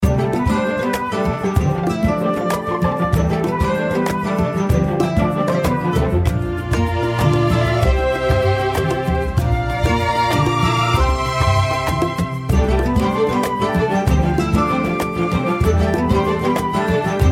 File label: bgm lobby